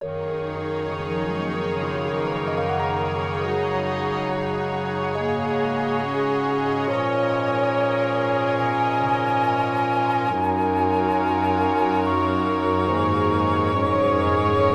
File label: orchestra, dusk; syncopika